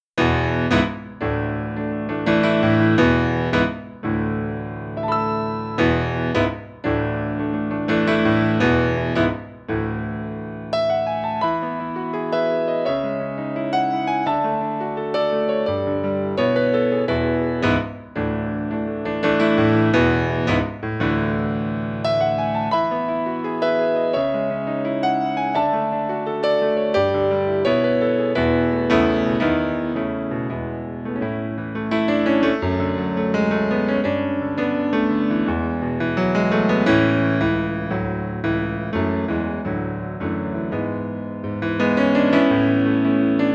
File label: Modern Piano; es-520